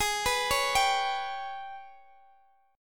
Ab Minor Major 7th Double Flat 5th, Guitar, Open D; x,x,18,17,16,17; down